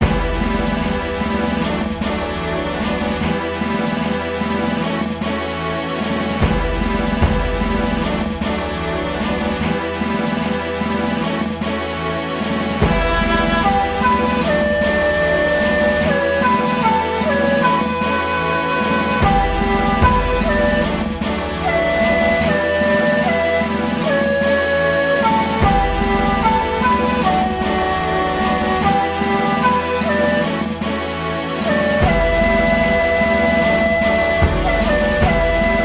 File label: Flute, Shakuhachi, Strings, Percussion